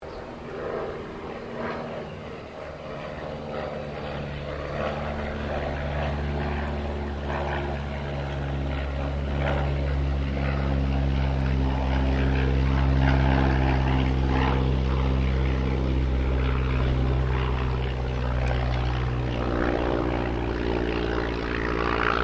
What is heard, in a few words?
AIRCRAFT RYAN STM-S2 PASS SLOW; 1939; Airplane Sound Effects; Airplanes (Sound Effects:Vehicles)